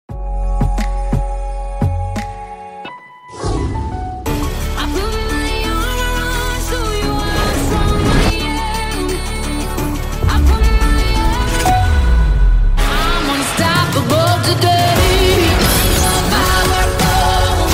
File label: s22 unstoppable ringtone; samsung s22 ultra